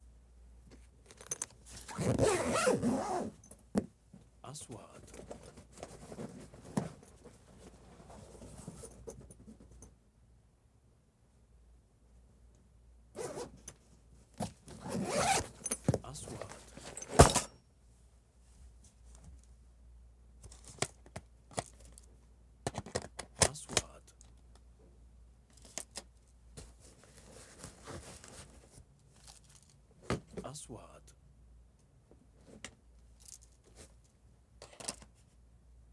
صوت حقبة معدات التصوير; sound effect; shooting

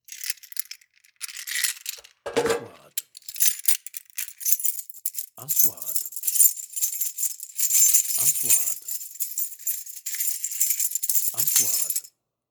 مفاتيح خزنة; sound effect

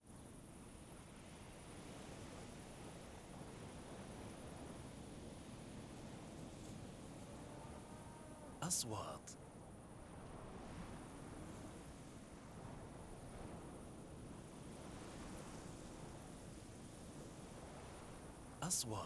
صوت صحراء; sound effect; رمال

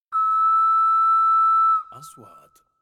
إنتقالة صافرة, transitions

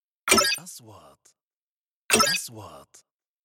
نغمة نقره الكترونية, transitions

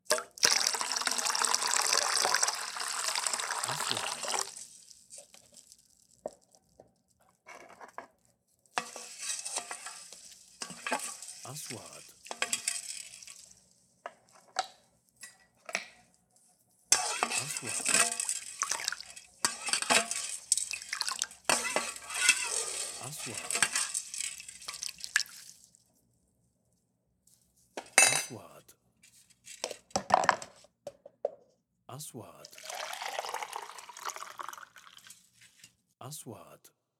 صوت طبخ سكب مويا خليط ملاس; kitchen

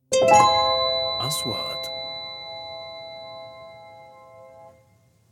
إنتقالة تنبيهية; sound effect; transitions

